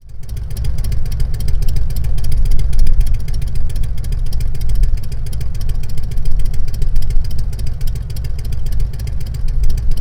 Touring 107 Slimine 4 Idle

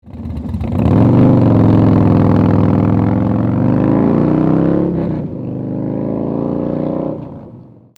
TAKEOFF, motorcycle

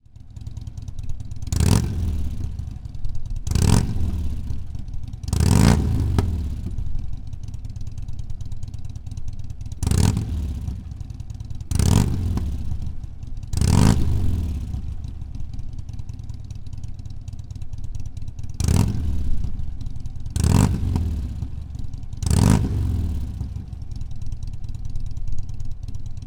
Touring 103 4 Slip-On Rev

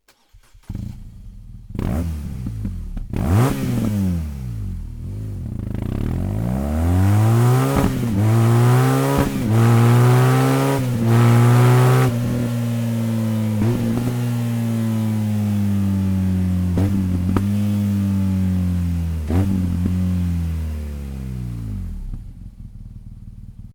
slip on exhaust bmw r1200 gs adventure; akrapovic

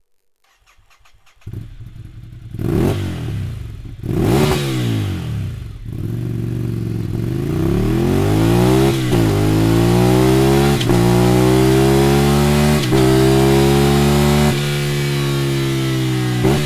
akrapovic slip on exhaust ducati scrambler

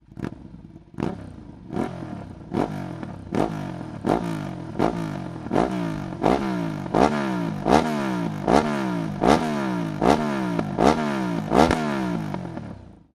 hyper cone slip on exhaust ducati diavel; remus

